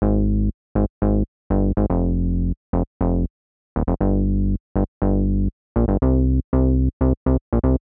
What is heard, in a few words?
Bass, 120bpm; Zero-G; Nu Disco; Nicengroovey C